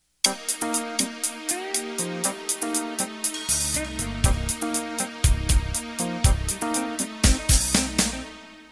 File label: Fusion Intro